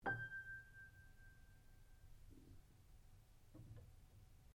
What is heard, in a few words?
PIANO UNTUNED G5 P, Baby grand piano left to fall out of tune; Pianos (Sound Effects:Musical)